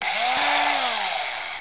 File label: mixer